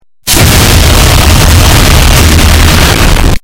chess distorted; dota

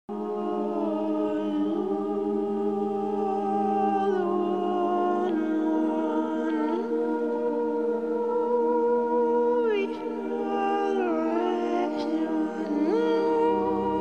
scary music

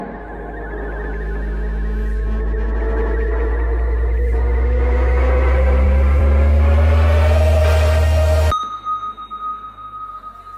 beep

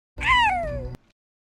howl

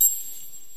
triangle

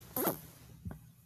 shy fart

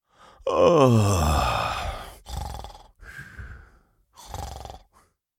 falling asleep

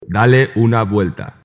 jazz

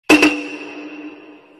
kabuki clap

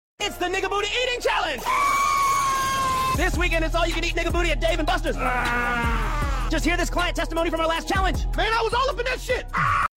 eating

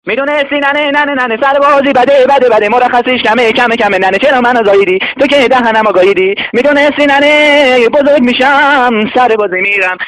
turtle singing

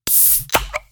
can opening sound

